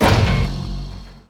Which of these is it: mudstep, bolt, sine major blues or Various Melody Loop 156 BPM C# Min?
bolt